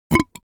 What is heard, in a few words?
creature monster burp